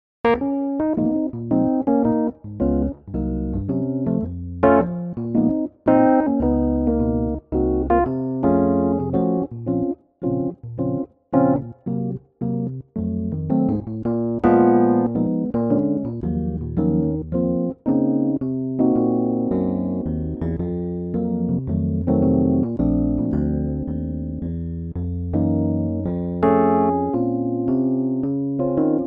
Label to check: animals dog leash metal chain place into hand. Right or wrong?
Wrong